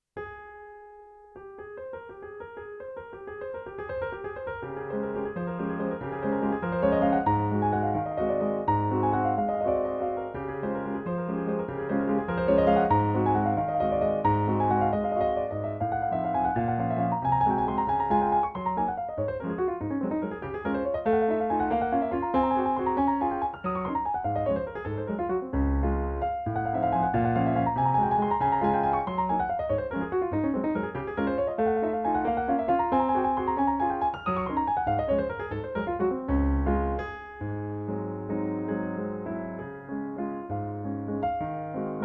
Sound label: Chopin Waltz in Db